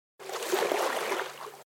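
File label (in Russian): короткая волна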